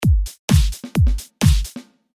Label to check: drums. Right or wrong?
Right